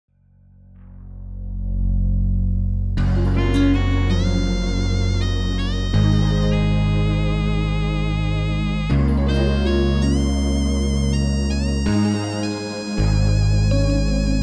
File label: Instrumental; Scars; Sinister Minds